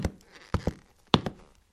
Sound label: footsteps; roblox